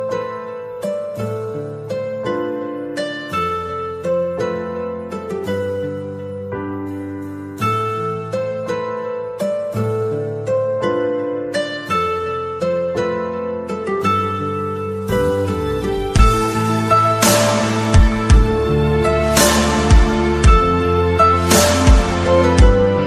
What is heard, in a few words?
bgm music ringtone; kabir singh; english ringtones